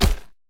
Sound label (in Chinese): 嘎枝摇摆声